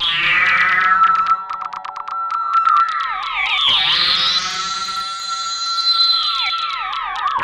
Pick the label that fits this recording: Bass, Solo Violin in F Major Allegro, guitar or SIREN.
SIREN